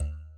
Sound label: thoing deep; angband